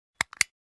camera lens cap; NCH Library

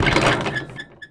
Street Ball Glass Basket

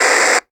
Walkie Talkie Static; Communications; 1001 Sound Effects

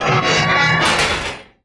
Gate Metal Creak Close; Doors

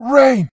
Water; AudioWizards; Mezafort

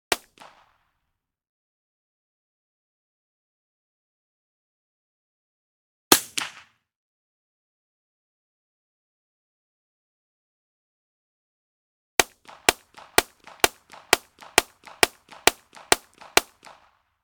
.22 Pistol Blast Long, Rapid Fire; Hollywood Edge; Heavily Armed

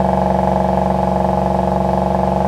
98 Trans Am Loop, Automobiles

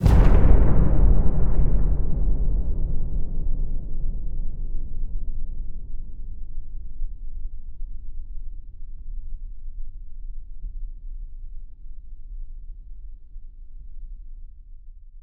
STING Unleashed Beast, Cinematic Metal Impacts

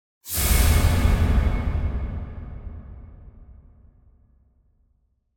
Metal Reversed Impact Sharp Metal - Strong; Hollywood Edge; sound designer's toolkit v2

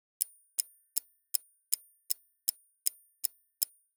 Clock Tick - Close Up - Thin; Hollywood Edge; sound designer's toolkit v2

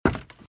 Footsteps - Wood - Creaky - Run - Right

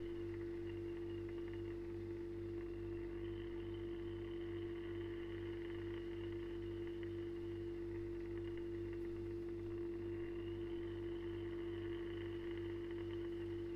fridge; devices accessories utilities; NS Studios - ultra sound collection

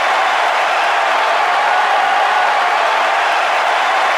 BBall Crowd Cheer Loop; SFX Kit; Crowds

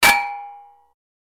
metal hit; firefight

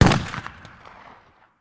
Bullet Impact; Sound Ideas; Guns

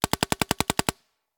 Paintball Gun; Sound Ideas; Guns

